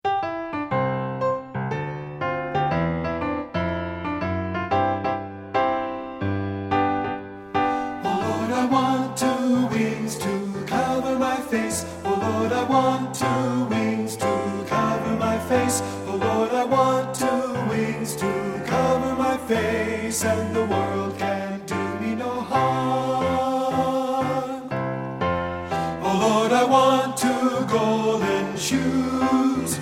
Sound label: 3PT BARI; I Want Two Wings; partbypart